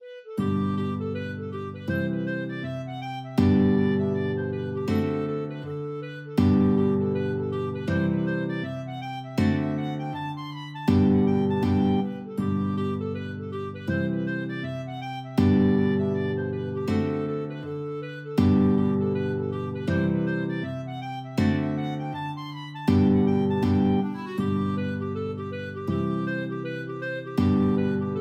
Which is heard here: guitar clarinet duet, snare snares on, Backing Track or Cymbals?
guitar clarinet duet